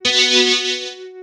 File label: Smooth Synth F#3; Ableton Live 12 Lite; Core Library